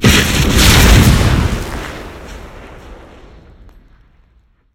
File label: exploding; asgardianstones